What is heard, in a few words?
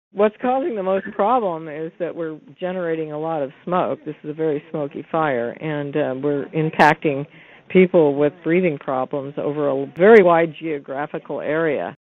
fire